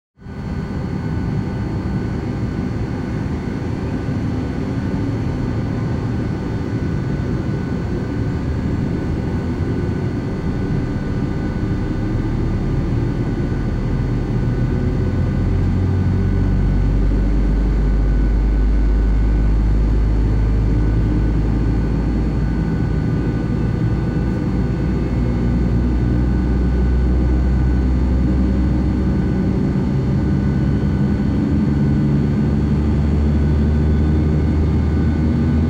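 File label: Boeing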